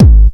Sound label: Long Clipper Kick